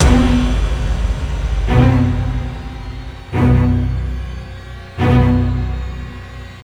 Stunned

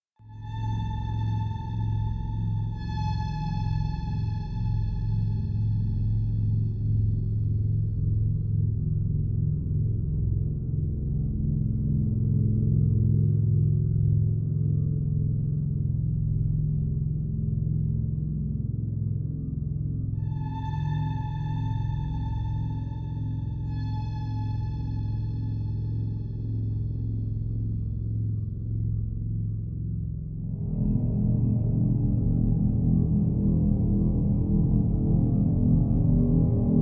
slow music; Among the Sleep

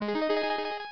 Pickup Item; pac man kart rally java

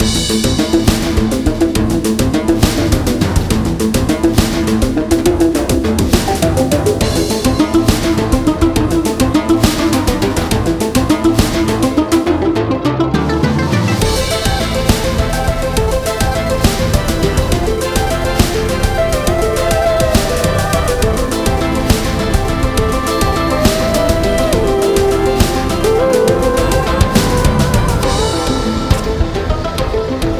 Boss Fight Loop; Apple Slash

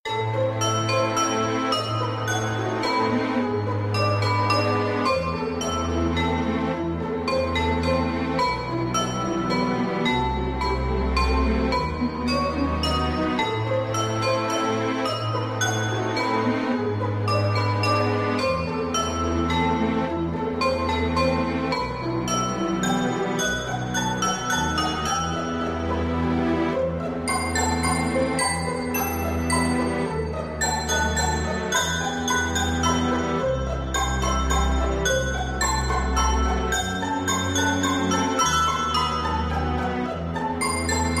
Scratch; Curse; Seec Inc; Android

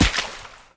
Footsteps; SFX Character

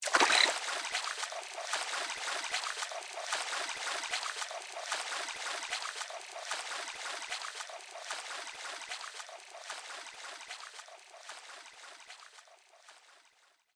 Fish Fight

